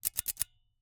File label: Ice cream Scoop, metal, mechanical, teeth, gear, squeeze, release, double; Eiravaein Works; Vaeyan IV